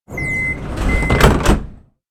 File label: Train door closing; vehicle